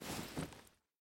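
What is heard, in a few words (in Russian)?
короткий спокойный звук шитья на машинке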